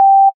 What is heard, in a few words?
bass c